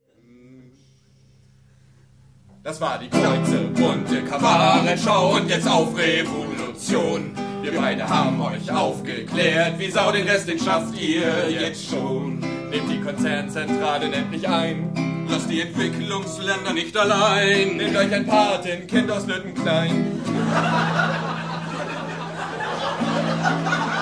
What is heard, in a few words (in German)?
folk